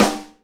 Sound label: SNARE RIM, Live Snares